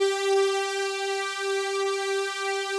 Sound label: FAT P-10 G4, PROPHET 10; Greytsounds VOL-1